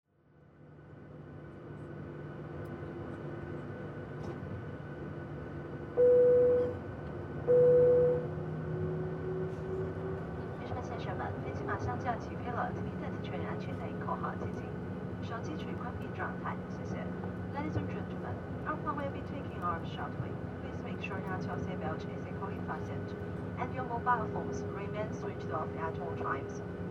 transport

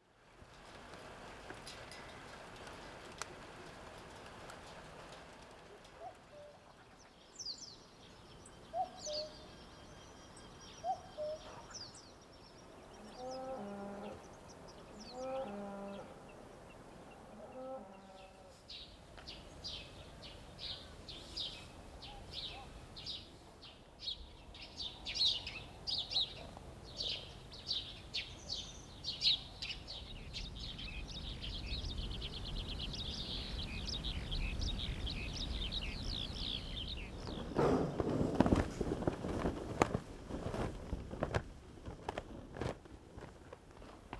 quiet village

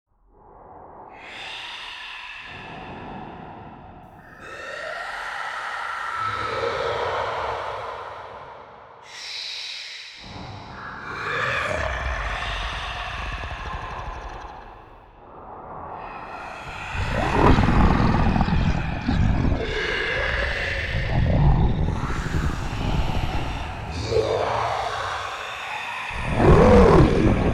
creatures

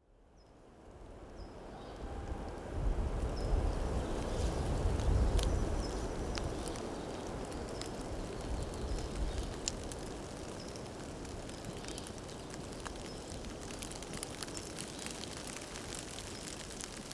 windy forest

